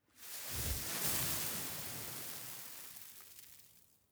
sand gust; Counter Strike Model Pack; CS:GO; dust2

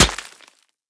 concrete impact bullet

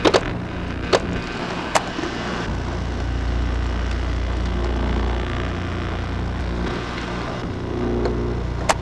combine terminal idle, machines; ambient; Counter Strike Model Pack; CS:GO

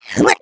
eggbot jumps, taunts; player; Portal 2

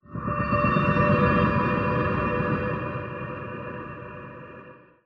amb prepper rhythmic, ambient

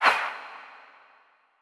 ghost swish, halloween; player

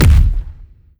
bass heavy, zombie blind step; Half Life Alyx; creatures

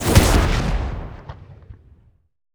missile land; Counter Strike Model Pack; CS:GO; survival

